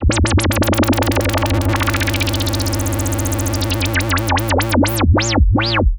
Juno Bubble

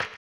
HAMBONE, Vocal Blues Soul; Spectrasonics; Planet

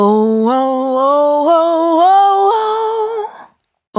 Counter Balance, Single Voice Melodic Loops; Singles

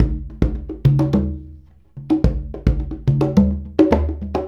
Congas; Essential Percussion

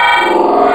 Turbo Sleigh Bells, wavetables; Animoog

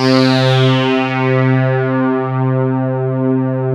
REZZZ PAD, KORG O1/W; Rubber Chicken Software Sample Bank

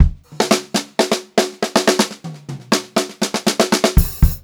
121 FK FILL L, Funk-Groove Drumloops; USB Soundscan vol.38; AKAI; FUNKY

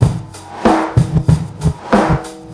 Hip-Hop, Bonus Loops; Sonic Foundry (Sony Creative Software); Hip-Hop Street Beats Construction Kit; Street Beats by Poogie Bell

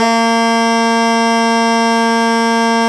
BASS SHAWM; SoundBytes - Early Patches

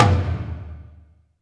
FLR, TOMS; Back In Time Records; Big Bang

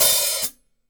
Hi-Hat Open Stereo; Sonic Foundry (Sony Creative Software); Vital Drums

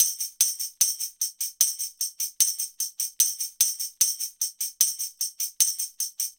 Tambourine; Sonic Foundry (Sony Creative Software); Joe Vitale Latin Percussion

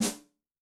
Dry Guy, Yamaha DTXpress-II snare

